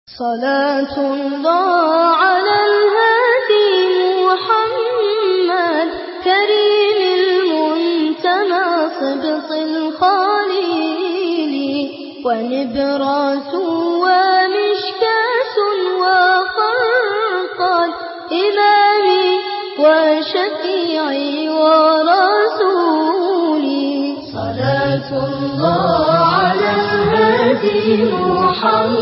beautiful Islamic

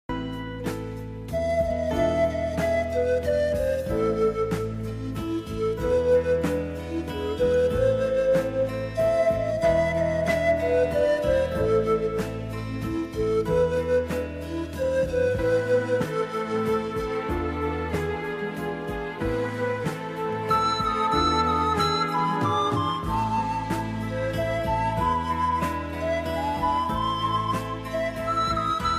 cute flute melody

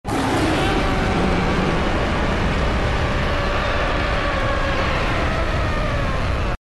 Hollow Roar; Ichigo

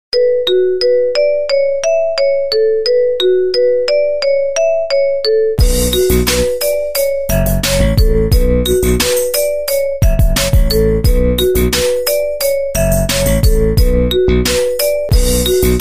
ring tones

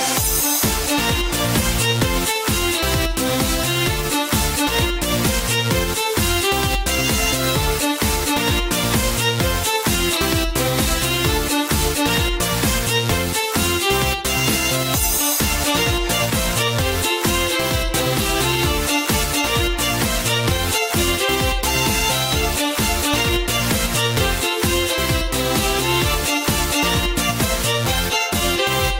Dance of the Violins

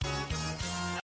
duh dunnn dunnn

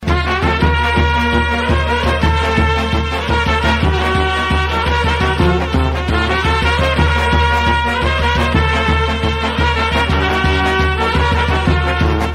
serbia trumpets; guca

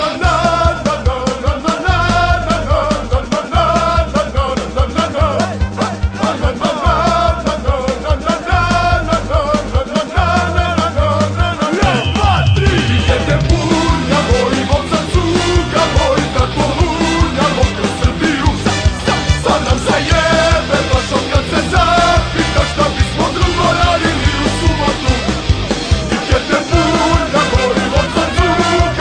Electro

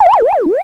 Failed

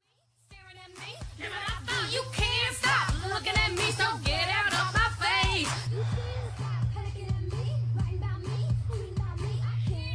singing cow in shower

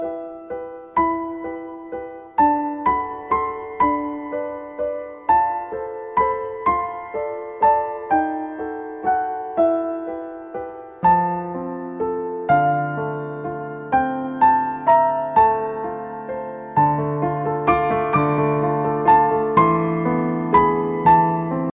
Musical Box

